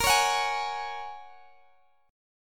A sharp Minor 7th Sharp 5th A sharp, Guitar Open D